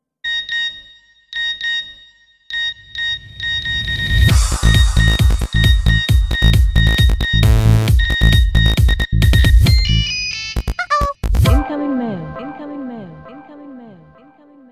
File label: Nokia Sms Remix Tone